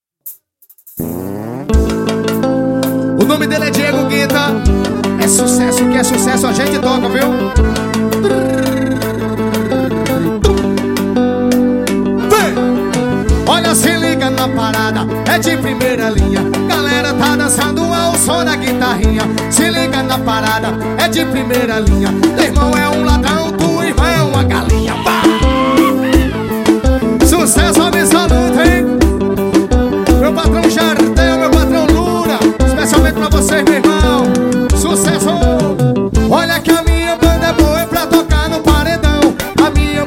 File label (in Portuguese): forro da guitarrinha